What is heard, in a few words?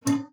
LPLK C4, SPINET; Xsample VOL-7